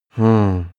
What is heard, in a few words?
male sigh disappointed